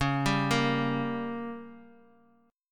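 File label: G Diminished, D flat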